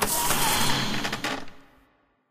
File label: switch press, buttons; video games; source engine; portal2